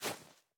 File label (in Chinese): 细雪破坏声